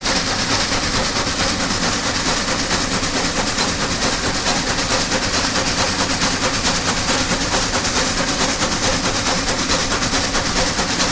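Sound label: Challenger Fast Steady, steam train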